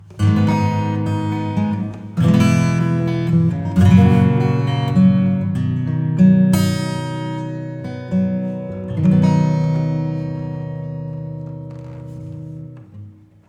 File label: Reverb Compare Acoustic; PhoenixVerb